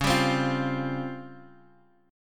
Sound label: Db Major 7th Flat 5th Db down Guitar Half Step Down